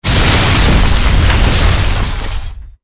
Building Heavy; Sfx Building Sanfran Heavy; Godzilla Smash3

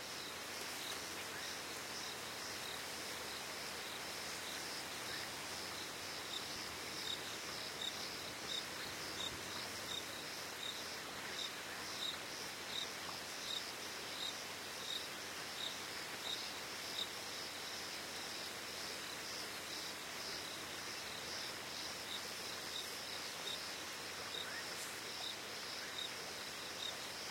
Atmosphere Rural Water Flow Close

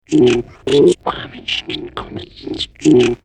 Grumpy Sleepy; F.A.M.P.S

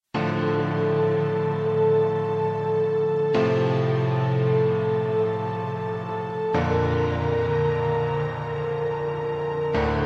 Sad Less Dramatic; bermuda

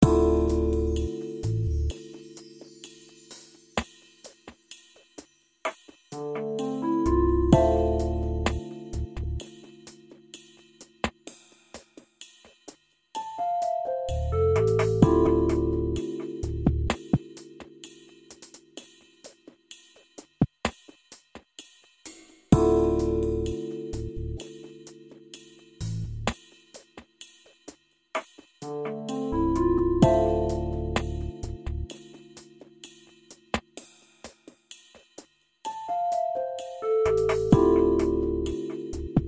Clubsound

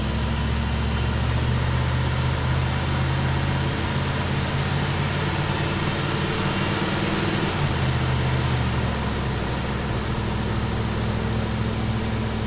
vehicle suv drive

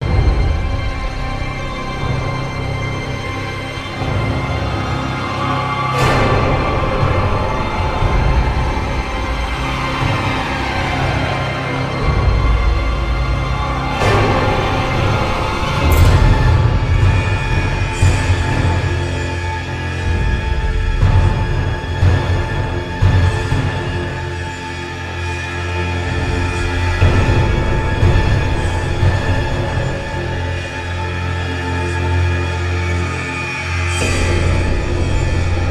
Tension Riser; Dungeon Hunter 4

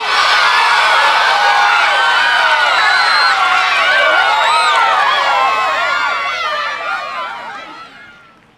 cheer

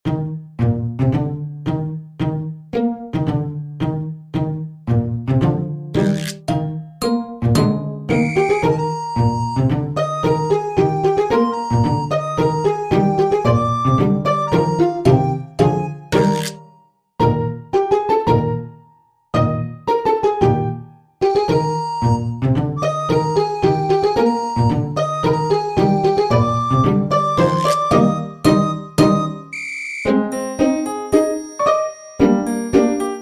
bgm funny; kardia fantasy mamono hime tachi to bouken monogatari; android

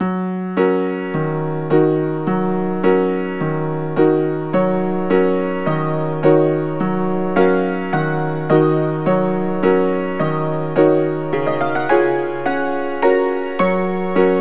Dance Chachacha Medium; Knigge Spielend zum guten Benehmen; NDS

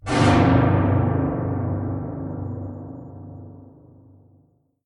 Impact; Five Nights at Candy's 2

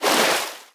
surf turn; talking tom gold run gamerip 2016

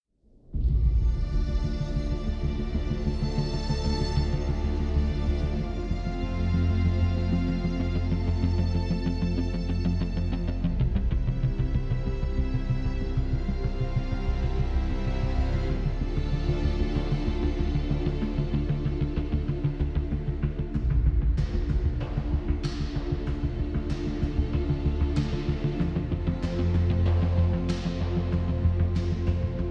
defeat sad; Takedown Red Sabre; Windows Xbox 360

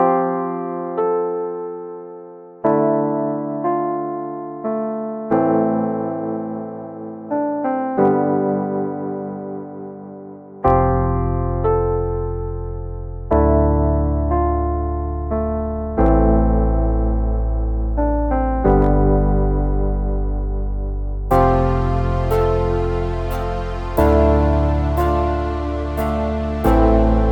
Soft Relaxing Track; Dual Blade: Battle of the Female Ninja

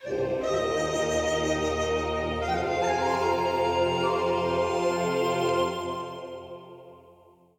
Jingle Win; Unruly Heroes; Indus

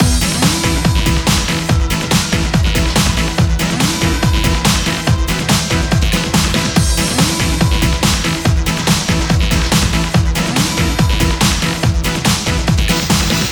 Sudden Death Loop; Move or Die; ps4

